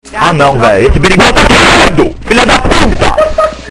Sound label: berimbau